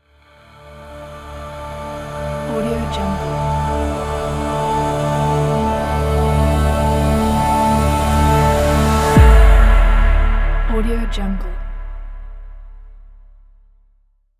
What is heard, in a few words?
logo reveal